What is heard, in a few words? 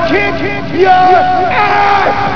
kick